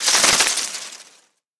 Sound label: Rustling Leaves